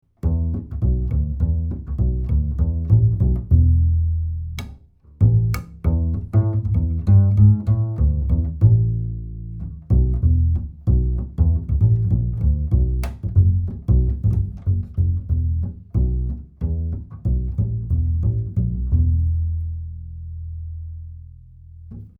Sanken CU55 Upright Bass 40cm UFX

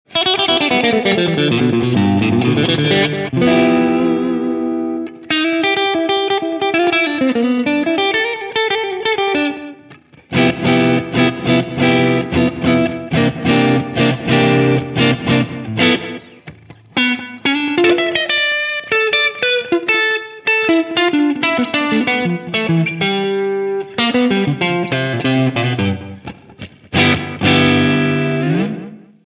Valeton GP 200 VOX AC30 TH Slapback

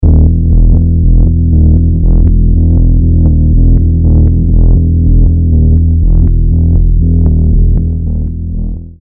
Dreadbox NYX V2

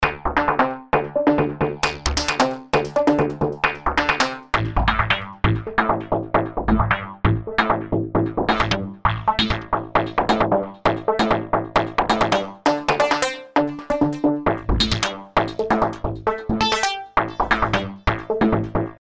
Kontakt7 synth

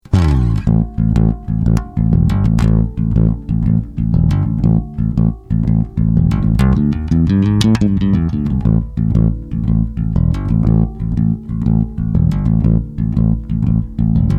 Treble Boost Finger

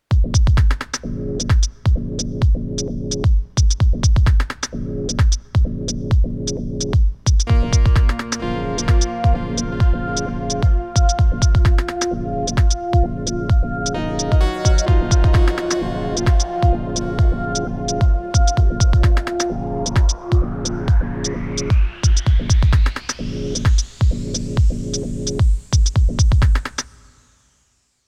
Isla 2400

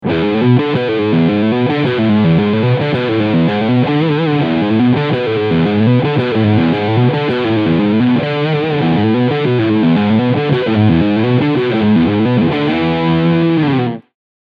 ARPEGGIOLINES

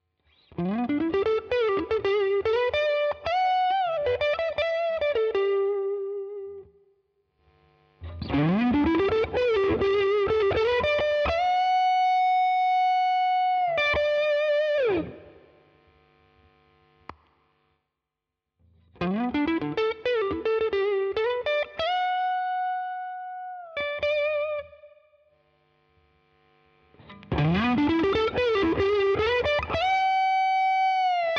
Strat Gainsettings

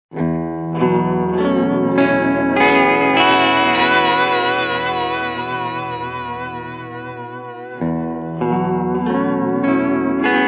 Sifter

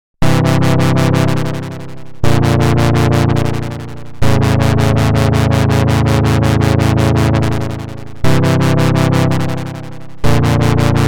Tri LFO Evolution Power Chord

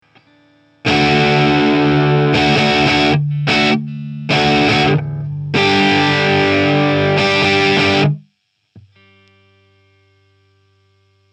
TC8210 Hall

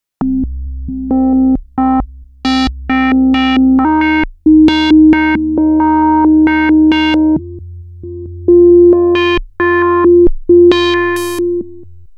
Roland SH-101

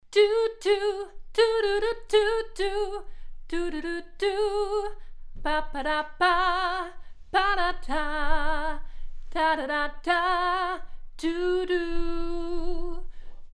V17 Female Voc 40cm

